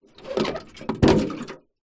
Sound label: industrial small metal container with liquid in set down on ground; zapsplat